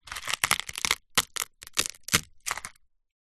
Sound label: crackers squash crack break crush